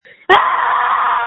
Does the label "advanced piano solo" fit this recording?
No